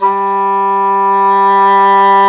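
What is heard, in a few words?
WND English Horn, Swell; Roland LCDP04 Orchestral Winds; Eng Horn Swl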